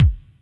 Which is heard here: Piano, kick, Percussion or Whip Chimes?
kick